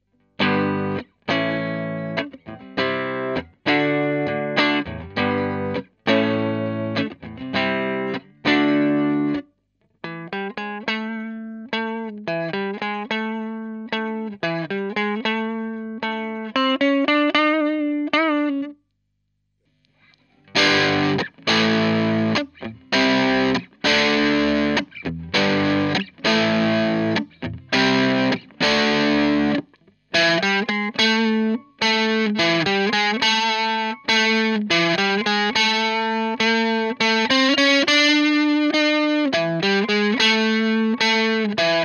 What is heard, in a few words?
DS1 MIJ MIT